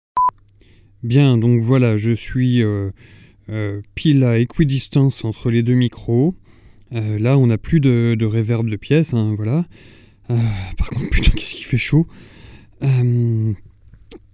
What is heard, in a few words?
cabinaural